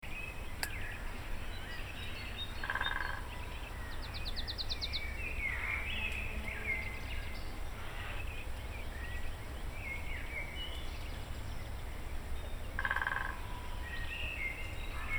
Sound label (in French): duo de printemps picbois faucon crecerelle